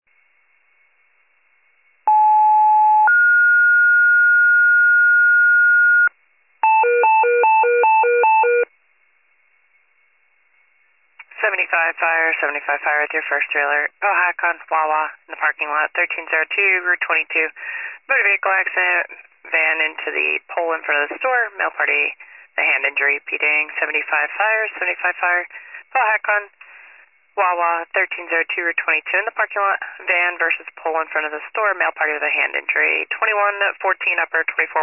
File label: fire, pager alert